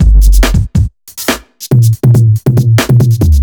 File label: Loops Fills; Vengeance Samples